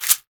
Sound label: Household Shaker, household rhythm samples Hits